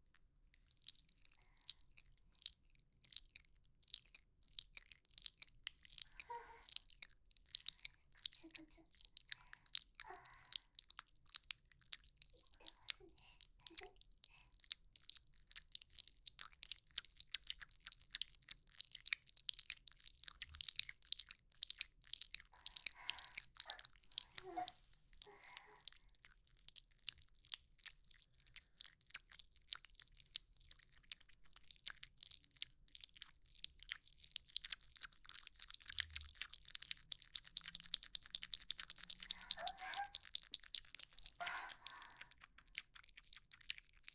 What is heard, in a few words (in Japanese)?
耳元 くちゅ音 ループ 両耳 バイノーラル